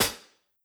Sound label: HIHAT, Closed Hats